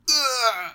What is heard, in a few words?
support die